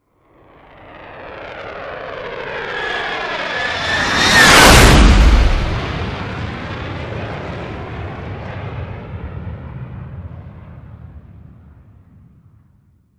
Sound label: F-8 Jet By: Very Close with Distortion.; crusader